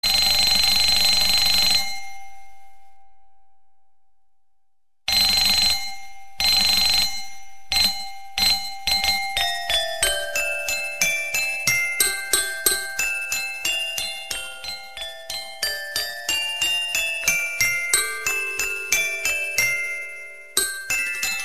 christmas alarm

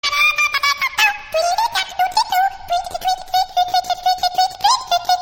tweet tweet

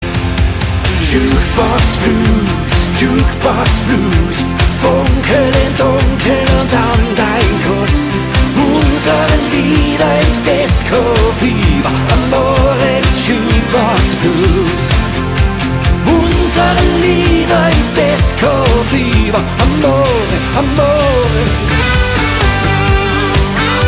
jukebox blues

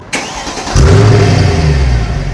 mercedes cl63 sound; Mercedes Cl63 Sound Klingelton

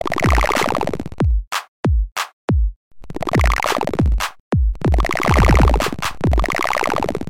wake up, Klingelton

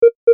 a simple beep

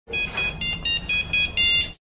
polyphone

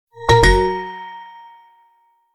Ore, Stock Notification; HTC 10 - Sense 8 Sound Suite

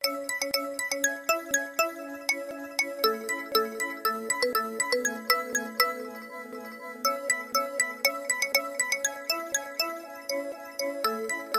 Samsung Galaxy C7

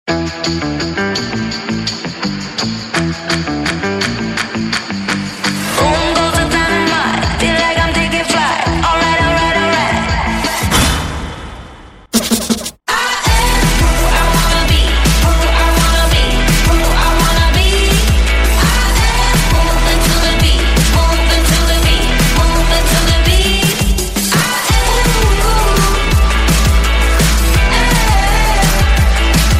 Samsung Galaxy Note 10 Lite

